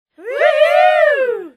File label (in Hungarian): beszed cheer